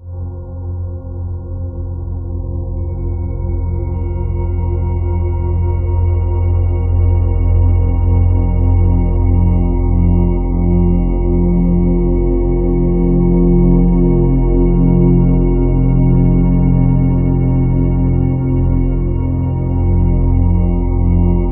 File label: 5TH STRA, SYNTH SND, Ominous Drones; USB Soundscan vol.48; AKAI